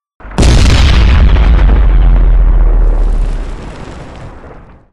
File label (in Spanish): Heavy Weapons And Explosions Sound Effects, gran explosion